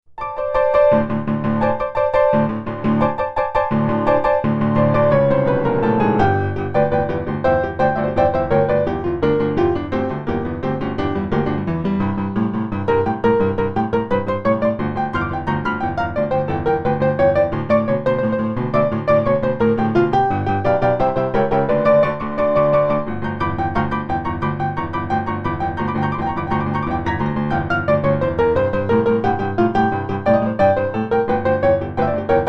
Venice Upright K8

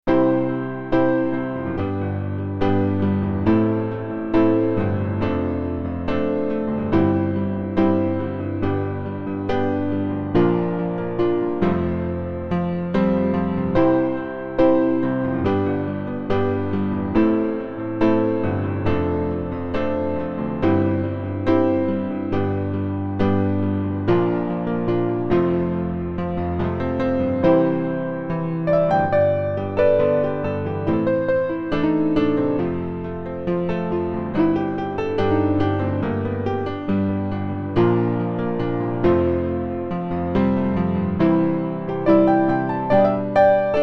Venice Upright K8

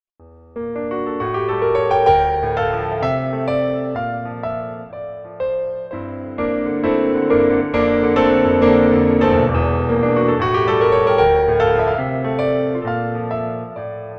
Grand CFX

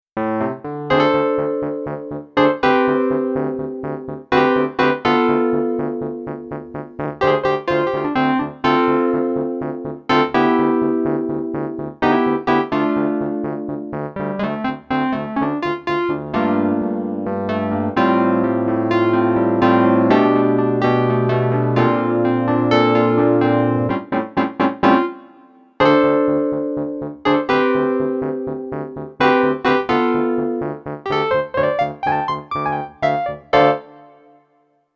Wurli

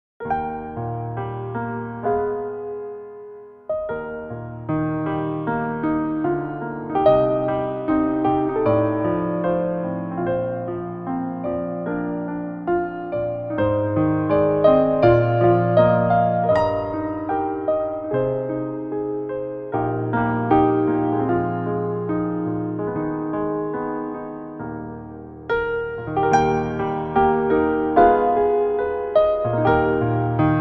Venice Grand CB1898

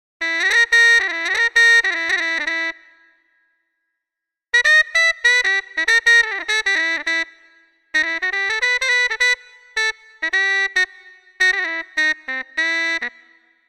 Harmon Mute, solo trumpet

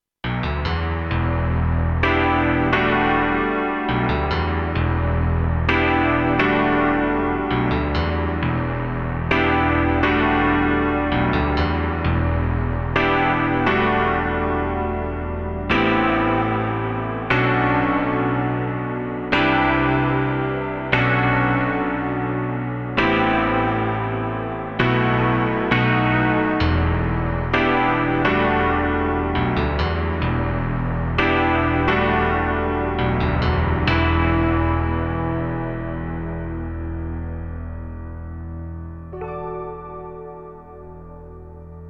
Compressed Prog Piano

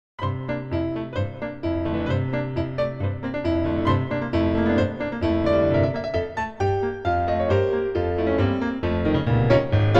Venice Grand D274

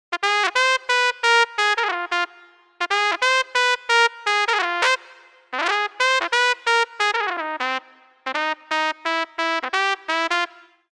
Shrill, solo trumpet

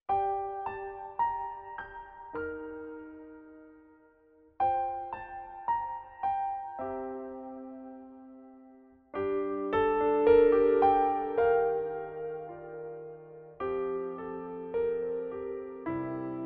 Venice Grand CFX Run

